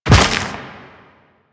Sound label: Step Dirt, Footsteps